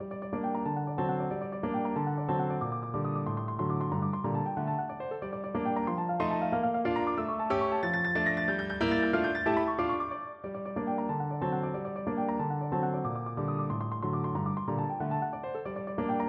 czerny, piano

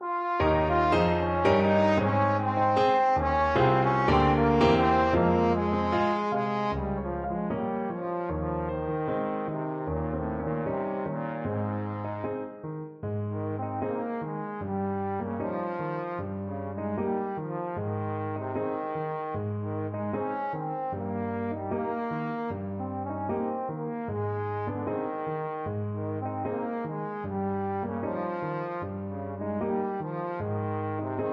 trombone

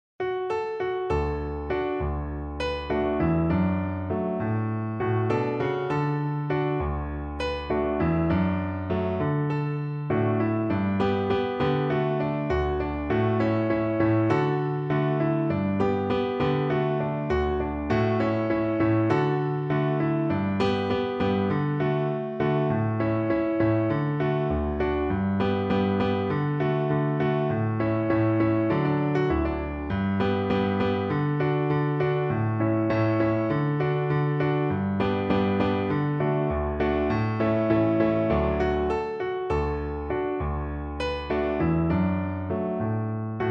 amawole PNO, piano

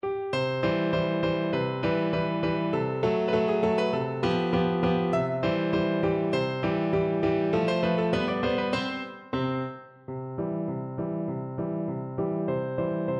when i was a lad piano

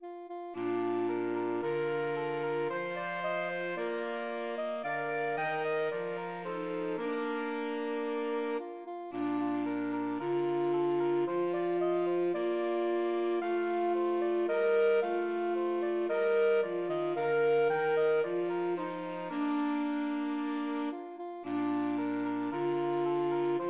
twelve days, saxophone quartet

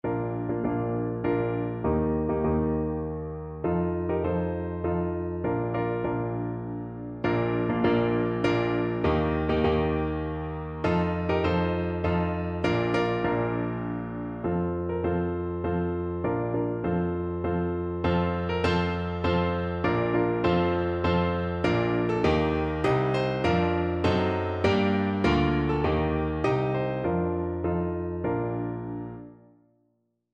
Pno

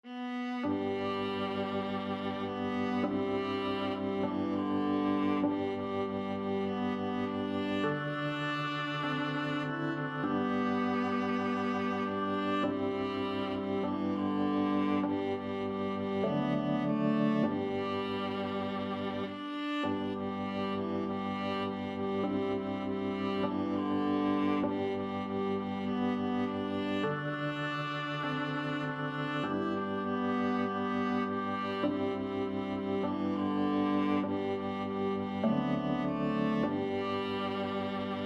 swing low, viola

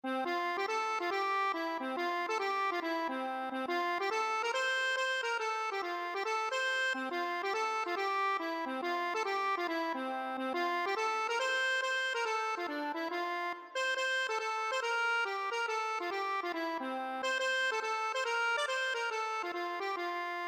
accordion

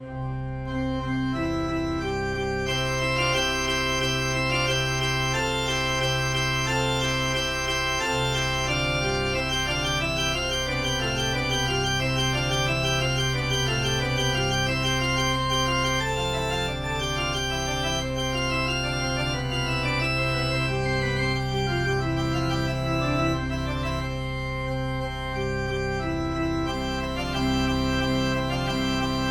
organ

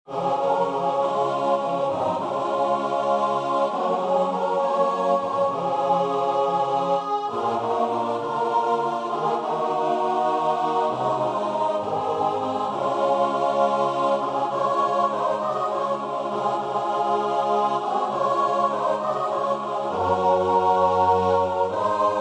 choir

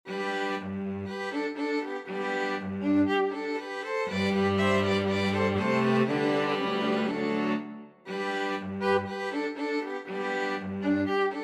STRQ, string quartet; mozart k487 no5

